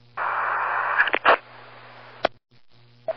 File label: Radio Click